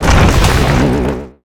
door; Shin Megami Tensei IV Apocalypse; 3DS

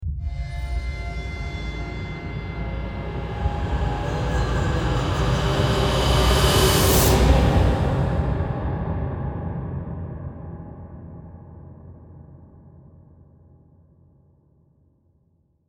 BGM Start; the initial

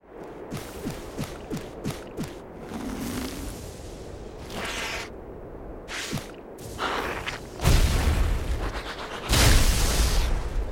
Appear

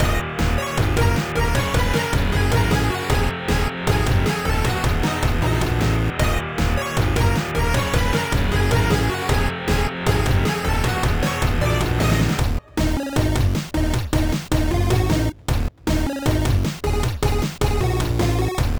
rock 'n' roll; Jack 'n' Hat

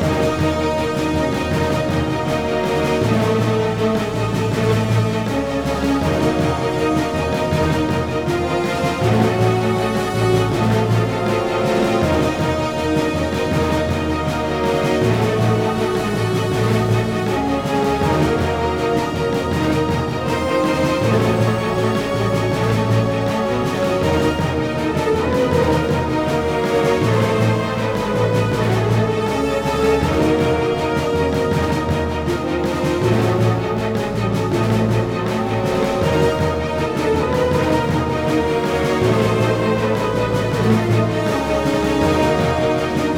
Music Segments Fight Cold Theme Hard 160bpm Loop; asterix obelix slap them all 2

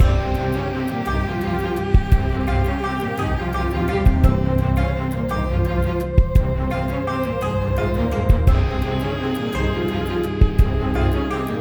Tension Loop 113bpm; project zomboid

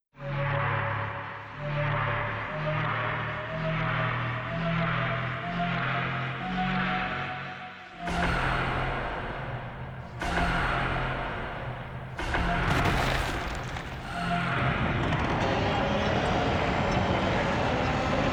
Thousand Year Door Open

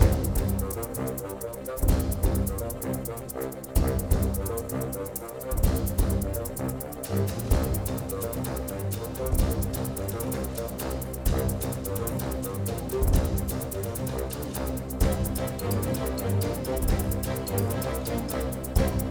Music Neutral Theme Bpm128 Loop; asterix obelix slap them all 2

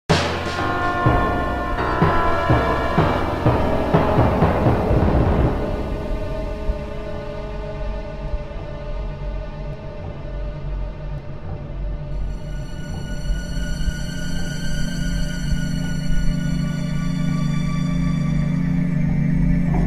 Death; Deus Ex Game of the Year Edition; Naval Base

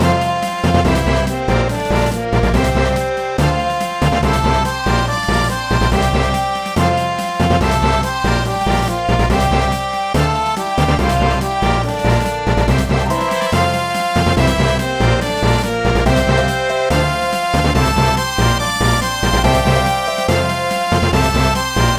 Fanfare; Dad Quest

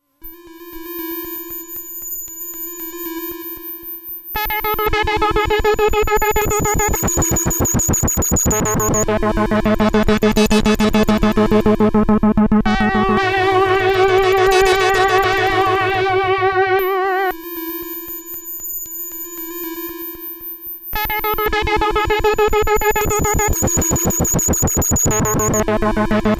Wave Motion Music

